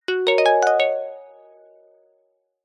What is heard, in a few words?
multimedia alert notification glassy plucked zen